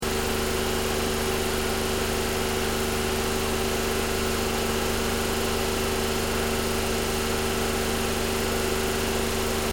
CAR NISSAN 350Z ENGINE BAY 3000RPM LOOP, manual sportscar; Cars; Vehicles